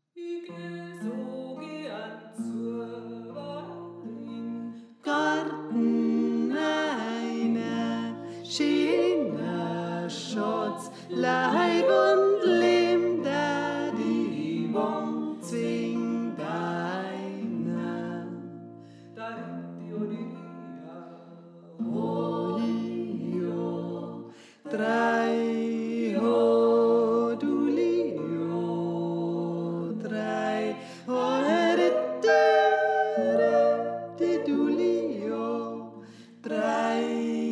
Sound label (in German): Tenor